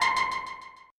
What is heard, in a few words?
synth; osu